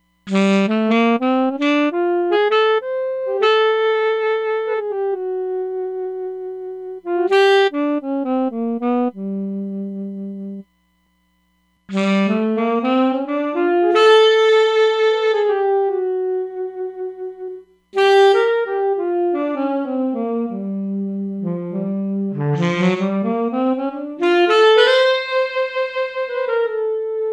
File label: Tiled Room, Roland DEP-5; Factory Patches